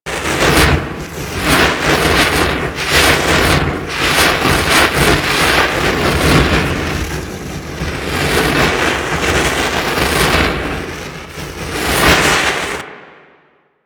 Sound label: Flame Thrower Loop; Cat Mstr